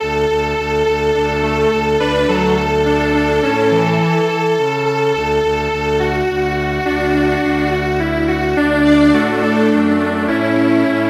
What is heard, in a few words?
Strings Quartet; Hambani kahle